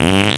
Fart

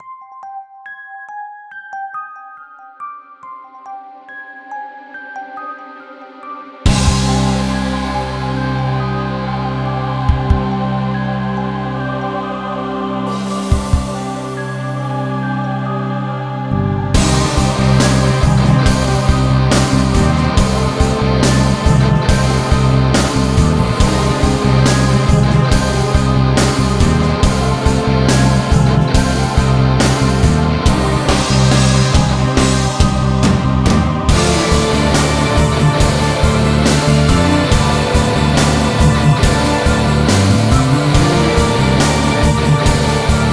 menu music; zombie shooter

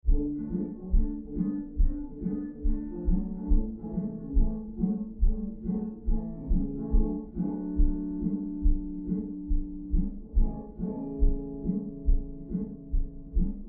Country; Echo Route 65

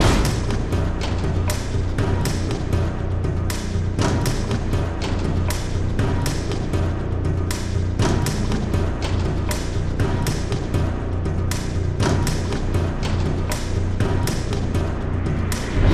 FAST PERC NO FX LP; transformers war for cybertron gamerip

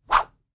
Whip

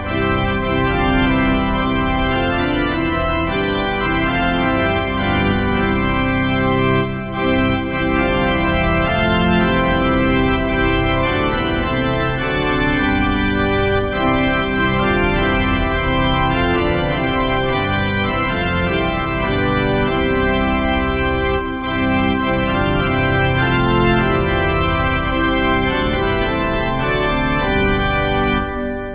Church Organ; postal 2 windows gamerip 2003

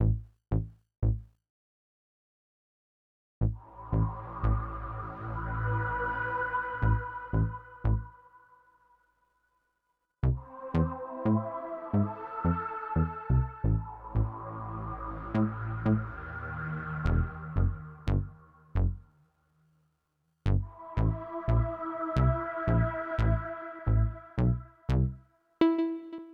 Music Amb Syn; MushroomBoss

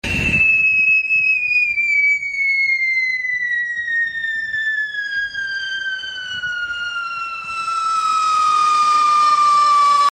Firework Rocket; criminality

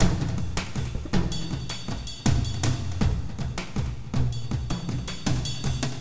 sounds music; Halo Combat Evolved; Xbox

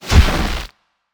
Orb Spawn; children of morta; Scorpion King

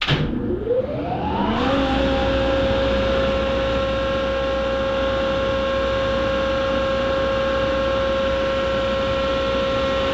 Servo Lift

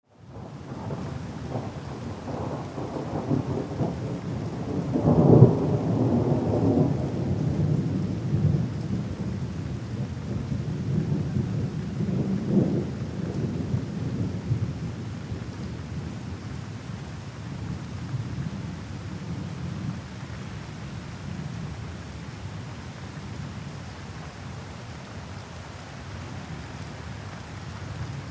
ambience storm heavy rain thunder rumble

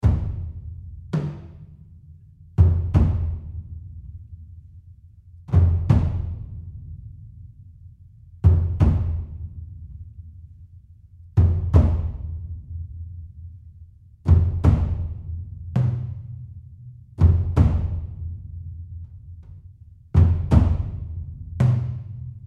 Marimba and Drums Mood

